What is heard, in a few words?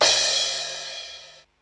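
pss795 Crash, cymbal; Polyend; Legowelt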